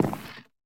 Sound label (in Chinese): 嘎枝之心踩踏声